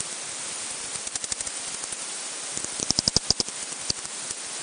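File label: front window common pipistrelle 45kHz Batbox Duet L only frequency division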